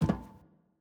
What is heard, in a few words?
Footstep Metal